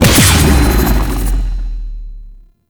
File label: Howl Damage; Warframe; Voruna